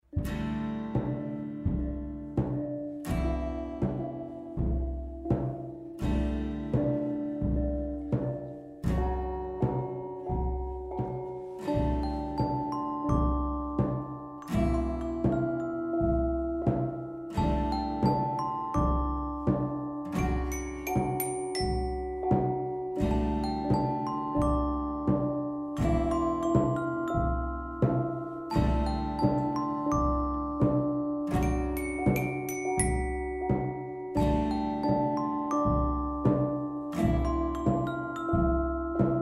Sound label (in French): Les cloches